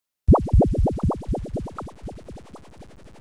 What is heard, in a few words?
Bubbles